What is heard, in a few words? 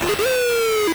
strike; mlb